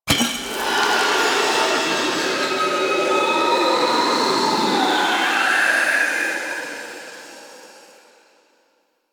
Tomb Urn Pickup; Mummy Tomb

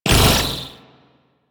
Briefcase Scythe Proj Bounce B; Crp Briefcase Scythe

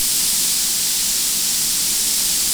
Pipe Gas Spray Loop; Warframe; Ambient; Structural; Pipes

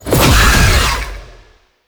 Infested Shotgun Teleport; Weapons

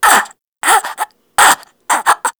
Infested Crawler Breath; Warframe

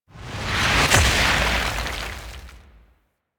Jump Attack Land Explosion; Enemies; Warframe; Infested; ZealoidPrelate

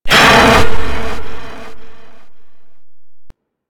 Golem Growl, Enemies, Vocals; Warframe

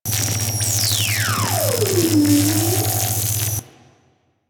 Energy Rifle Projectile Loop; Warframe; Weapons

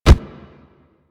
Truffle Jugger Step Default; Warframe; Infested; Juggernaut; Truffle Hunt

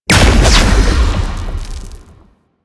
Spawn Large Enemy; Warframe; Man In The Wall; Enemy Gameplay

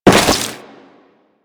Crewman Step Flesh, Footsteps; Warframe; Corpus

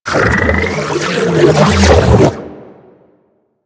Aqua Board Jump Charge; Warframe; Yareli

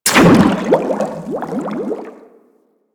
Frogman Burst, Vehicles; Grineer

